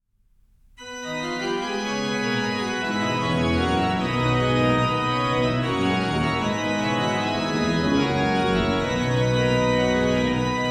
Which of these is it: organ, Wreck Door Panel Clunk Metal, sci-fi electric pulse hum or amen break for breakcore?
organ